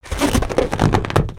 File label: Ballloon Animal; weapons; aronwk collection; ndaudio